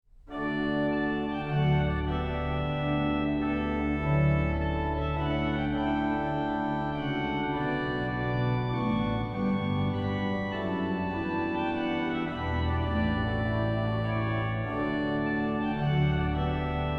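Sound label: trumpet tune, organist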